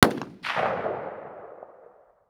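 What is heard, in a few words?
ak47 single distant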